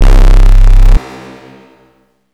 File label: Saw Detuned, Bass